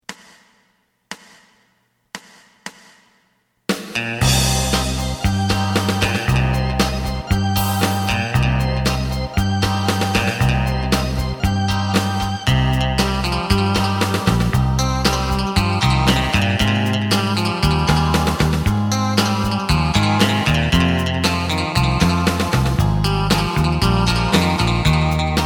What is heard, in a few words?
guitar